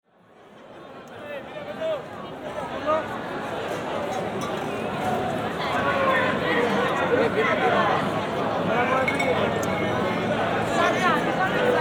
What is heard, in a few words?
Piazza Verdi soundscape; soundwalk